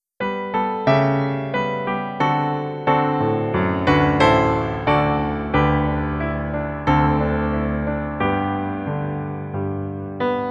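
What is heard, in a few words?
school song choir; schsong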